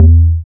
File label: log e, amapiano log drums